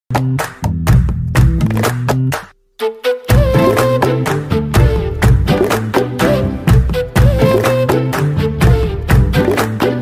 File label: bgm ringtone telugu